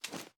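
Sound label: pistol 1911 ads up; gmod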